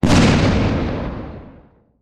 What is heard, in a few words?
Explosion Military Tank Fire; Fire and Explosions